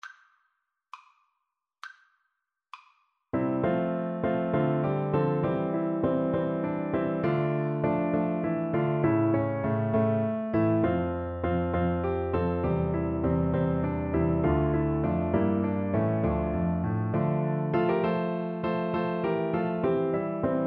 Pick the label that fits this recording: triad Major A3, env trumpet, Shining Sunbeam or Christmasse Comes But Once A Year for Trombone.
Christmasse Comes But Once A Year for Trombone